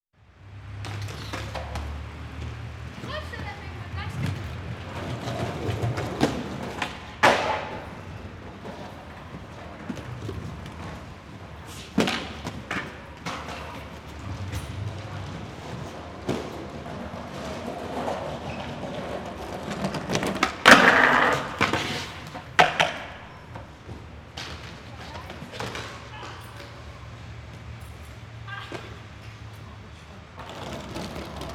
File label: Skateboard